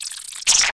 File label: beetle attack